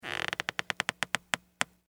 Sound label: Squeak Creak Stress Slow; Foley; Squeaks; Objects